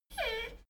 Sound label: dogo argentino whine short